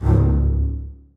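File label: basses staccato c2; Sonatina Symphonic Orchestra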